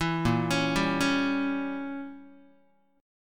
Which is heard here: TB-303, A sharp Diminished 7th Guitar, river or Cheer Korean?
A sharp Diminished 7th Guitar